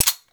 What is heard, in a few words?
Sniper Rifle Mech; Grineer; Female; Weapons